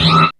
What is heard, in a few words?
SCREECH H